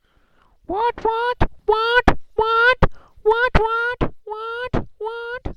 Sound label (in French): cocorico